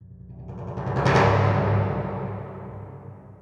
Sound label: timpani